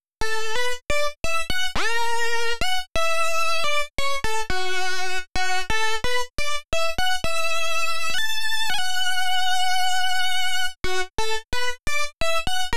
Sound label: Lead; リードレイヤー; 今回は2本レイヤーしているのです; 1本目はまあリードシンセらしい目立つ音ですね。; 無料シンセであるVitalでつくったのですが、